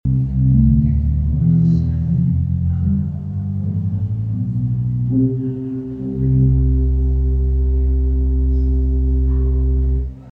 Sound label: mellow wood closeup